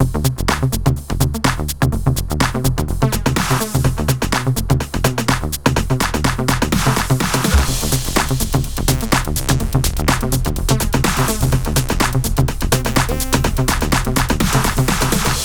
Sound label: electro psychedelic